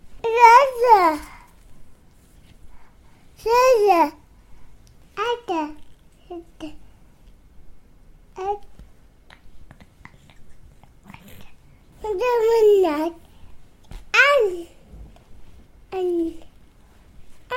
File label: baby talk